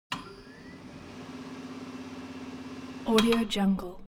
construction garage tire changing machine turning platform; latch long